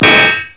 hit, weapons

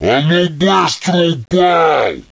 attack, troll

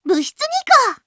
reloading; niconi

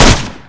GPMG

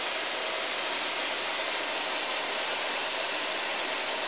circular

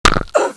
female fall pain

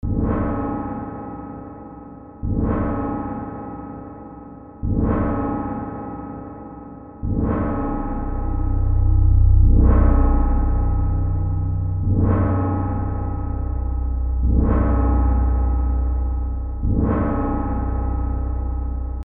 second pad

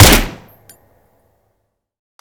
mp5 shoot end, weapons

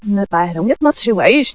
chant

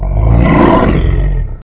pe idle

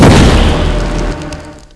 teleport warpball

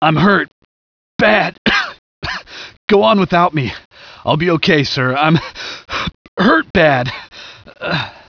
generic wounded

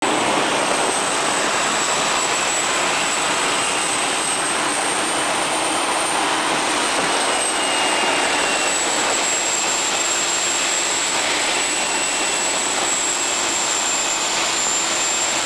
engine idle

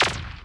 fire

